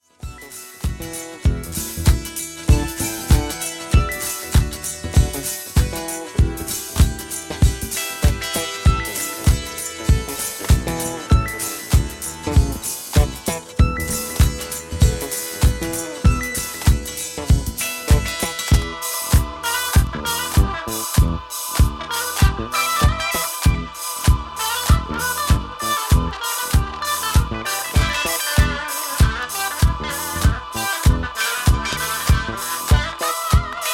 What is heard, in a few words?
Funky Bass; funk soul